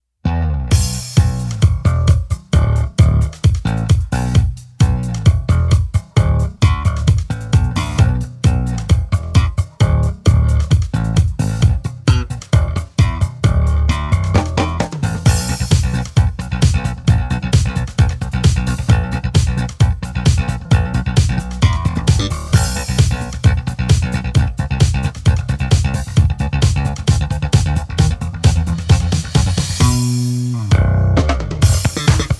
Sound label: Five Strings Bass Solo